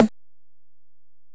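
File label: Acoustic Percussion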